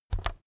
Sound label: keyboard external key press fast soft